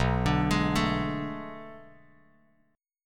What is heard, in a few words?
B Minor 13th B down Bass Standard; Bm13 arpeggiated; JGuitar